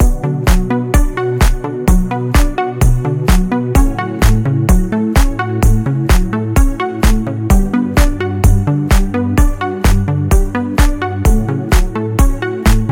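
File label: positive electronic tune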